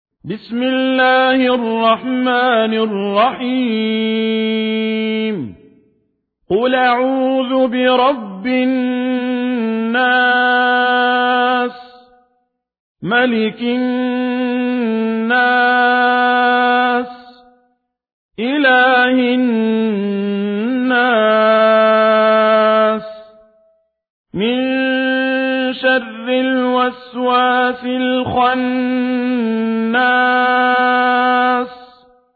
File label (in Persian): ترتیل ورش عن نافع; ابراهیم الدوسری; سوره ناس